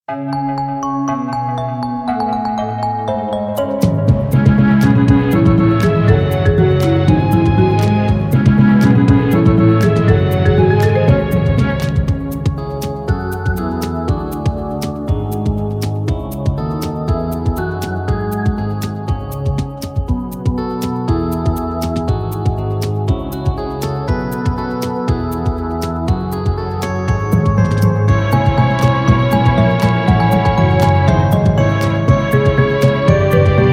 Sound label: inst